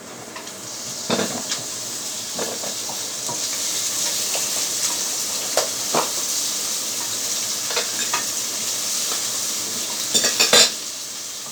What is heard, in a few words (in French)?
poele a frire en action; Bruitages